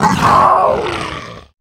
Death

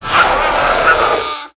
Amber Whelp Death

